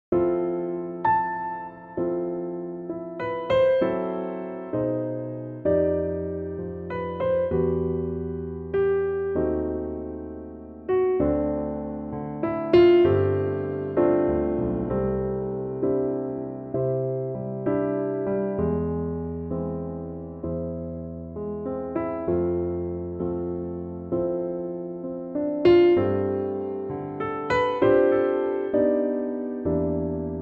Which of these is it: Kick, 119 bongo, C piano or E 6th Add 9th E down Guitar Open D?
C piano